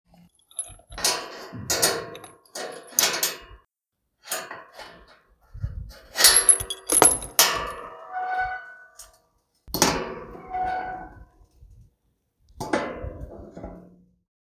Metal prison gate opened with slight keys rattling